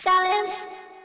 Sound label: Silence